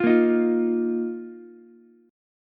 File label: A sharp Suspended 4th Ukulele; strummed; 10,10,11,8; JGuitar